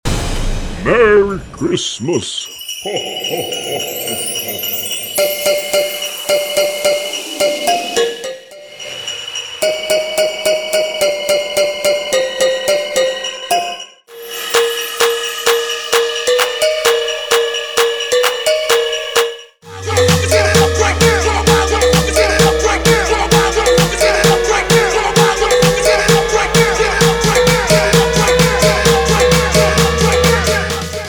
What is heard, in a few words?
open show navideño 130 bpm; Latin beats